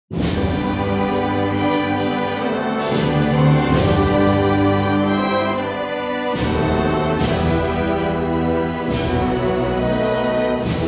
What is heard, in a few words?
Ukrainian National Anthem